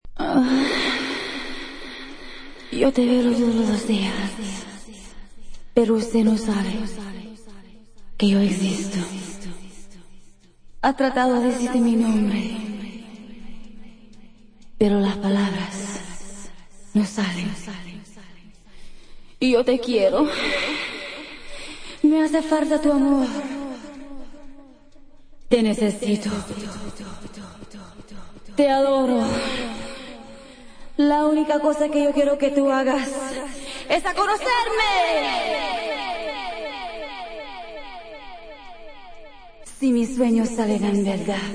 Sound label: acapella